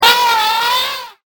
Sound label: elephant